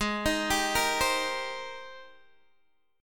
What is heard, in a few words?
Ab Major 9th Ab Guitar DADGAD